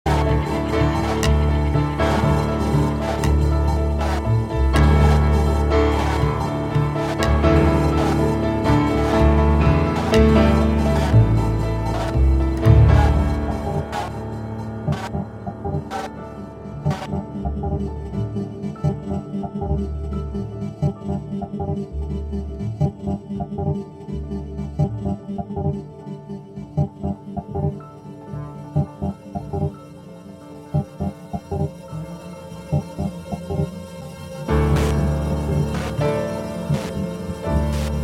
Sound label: piano